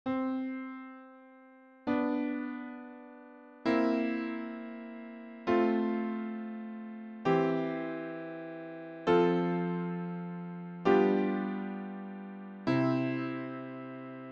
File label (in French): Accompagnement, vocalises